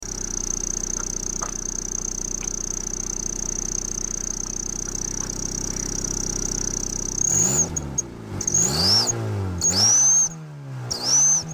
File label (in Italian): Suono Fischio Scarico; TuningGuru